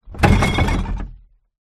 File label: household trashcan recycle card glass tins movement bang